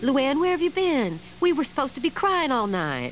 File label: cryin